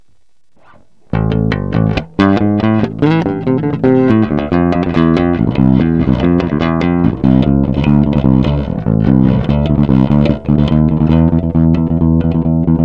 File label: Active PU Neck EQ Flat